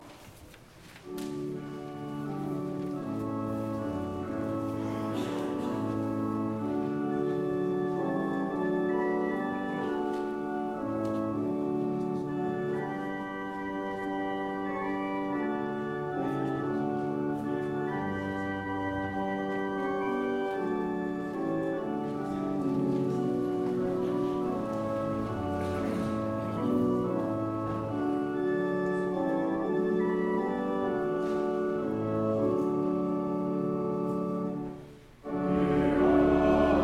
hymn

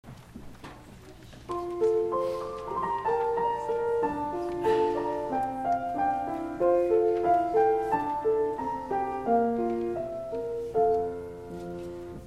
Treble Choir Anthem

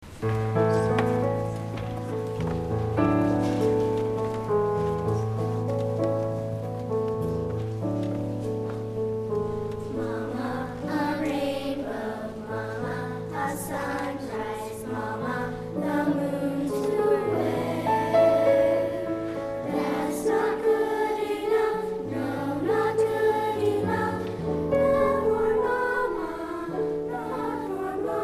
Prelude - Treble Choir

